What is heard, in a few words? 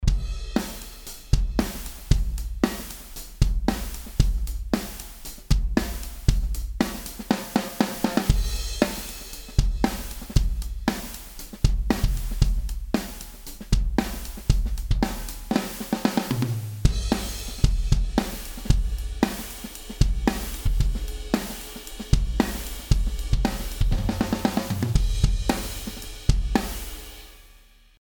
Kick n Sn Trig Flex Sine White Noise